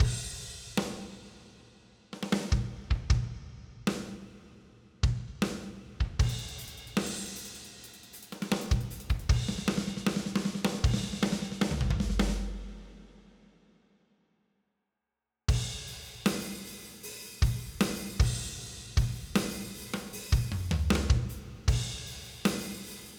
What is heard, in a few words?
LOUD ROCK ACOUSTIC DRUMS COMPRESSOR OFF